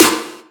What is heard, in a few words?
snare reverb